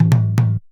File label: JUNJUNBA HIT; Best Service; World Colours